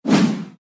Whoosh swoosh transition

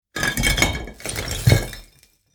Flipping various block pieces on a pile of rock

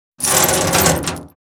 Large and heavy chains on metal

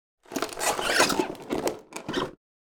Wood squeaks

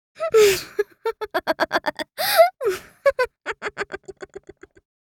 Little girl laughter

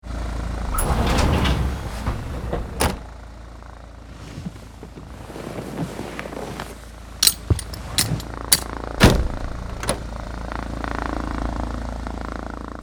Light aircraft inside perspective interior access

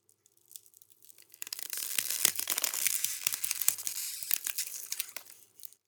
Designed cinematic clean ice cracks

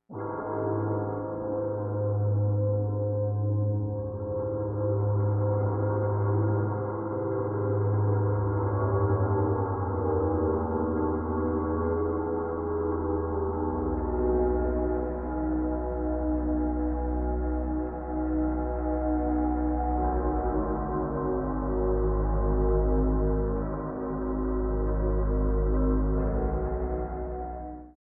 Dark horror drone suspense and eerie

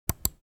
Mouse click

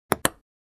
Table tennis hit the ball after it bounces

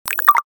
Digital futuristic alert

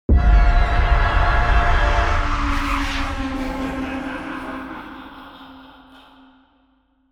Suspense logo intro effect ident; festivities

